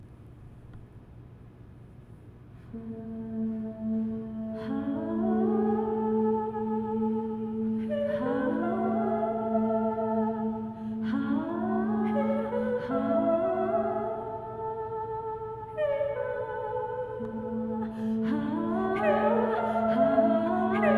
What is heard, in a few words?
Reverb Singing